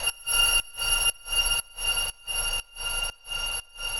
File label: Atmos 120, sidechained